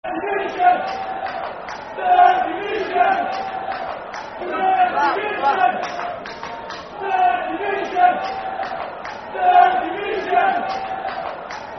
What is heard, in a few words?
Third Division Football Chant (Soccer Song); Walsall Wfc; fanchants